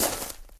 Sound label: rocks step; Golden Crayon Sounds